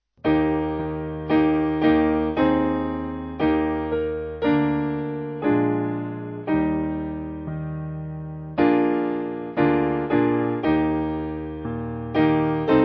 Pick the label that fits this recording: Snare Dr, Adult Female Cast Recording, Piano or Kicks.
Piano